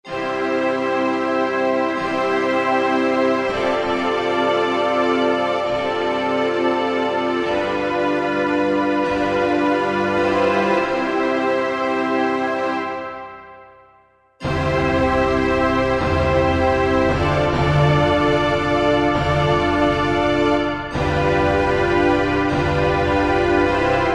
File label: ST Tutti, Yamaha TG77; Factory Presets